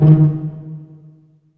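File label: D#2 pizzicato, strings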